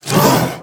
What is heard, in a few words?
Spider Grunt General Scream Start Combined; Deep Rock Galactic Boardgame